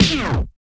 TAPE STOP, DJ TOOLS; Drums In Your FACE!

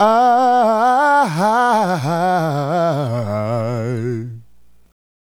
Adlib, Hip-Hop and RnB Vocals

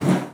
BOW PERC, BASS FINGER; Xsample VOL-5

